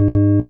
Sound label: wrong; singe